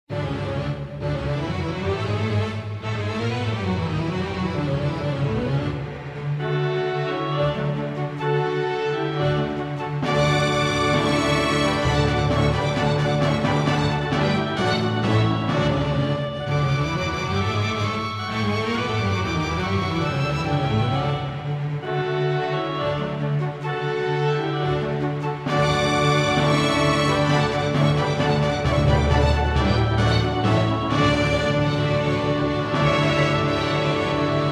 Easy Listening & Classical; Sound Ideas

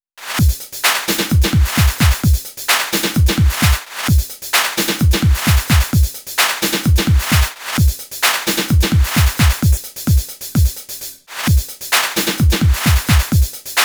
backwards clap

